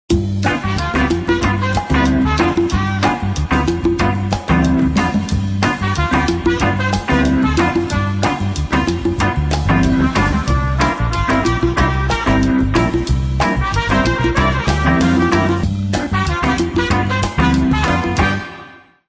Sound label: Retro; Motorola SLVR L7